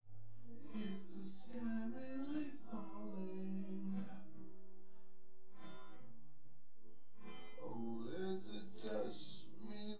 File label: nodrums